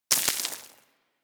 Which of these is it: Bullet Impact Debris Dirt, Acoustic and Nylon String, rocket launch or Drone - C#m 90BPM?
Bullet Impact Debris Dirt